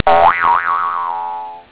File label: BRUSH